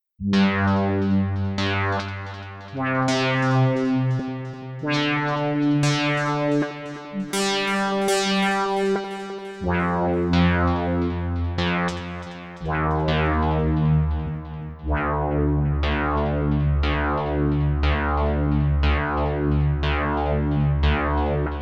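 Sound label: sequence mono drift; the transmitter soundset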